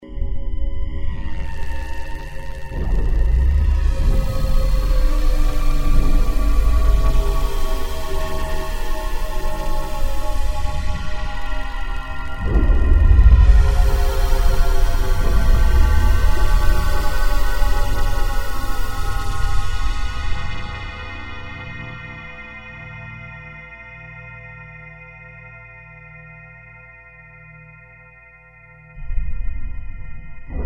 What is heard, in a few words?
ambient tex, yamaha sy99 synth; Ambient: textures